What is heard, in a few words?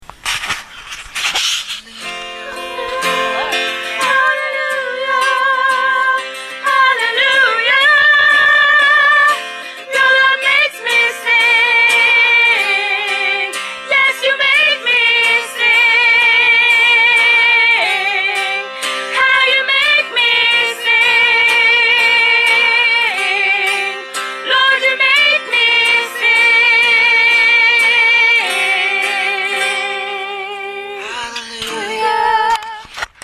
hallelujah soprano